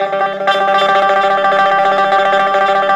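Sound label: EL BZ TREM G, bouzouki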